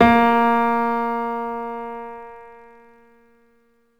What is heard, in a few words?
Piano A2 Sharp; android ios mobile switch; CoComelon: Play with JJ